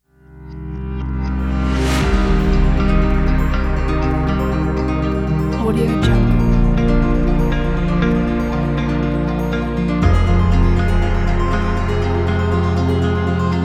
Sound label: rock cinematic; audio-atomy